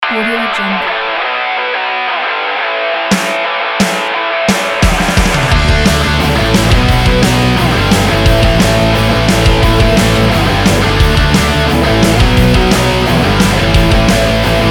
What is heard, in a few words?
fun punk rock